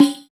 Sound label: Mazhar Dom, Tambourines, Roland BK-5 Percussion